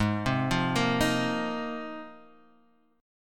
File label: G sharp 6th Add 9th G sharp